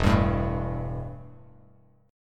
E Minor Double Flat 5th E, Bass Standard; strummed; JGuitar

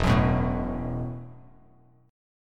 E Suspended 2nd Suspended 4th E, Bass Standard

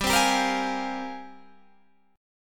G sharp Major 13th

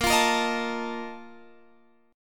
A sharp Major 7th Suspended 4th, Guitar Drop D

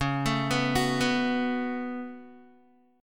Db 7th Suspended 4th Sharp 5th Db down Guitar; Db7sus4#5 arpeggiated; tuning=4E,4A,5D,5G,5C,6F

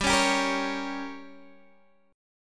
G Diminished, Guitar, Open G; x,12,11,12,11,11; down

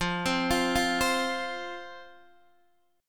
F 5th F, down, Guitar Open D

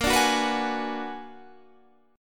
B-Minor Major 13th

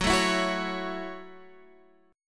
A 6th Gb

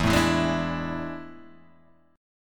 A Major 7th Suspended 4th E

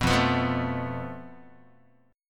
F Major Flat 5th Guitar, chord; FMb5 strummed; JGuitar

